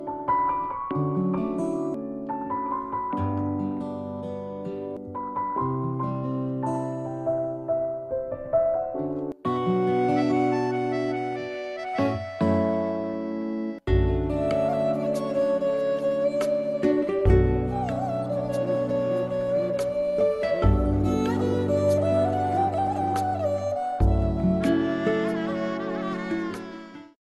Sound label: INSTRUMENT